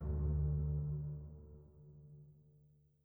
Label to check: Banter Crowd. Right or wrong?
Wrong